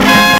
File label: HORN HIT; E-MU Formula 4000 Series Vol. 1; Hip Hop Nation